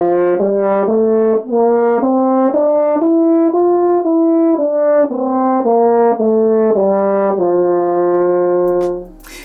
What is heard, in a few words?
French Horn Scale